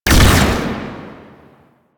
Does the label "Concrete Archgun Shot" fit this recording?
Yes